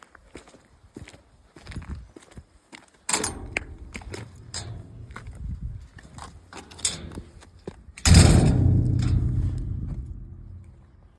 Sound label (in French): portillon sortie, BRUITS DE PORTAILS; CELLES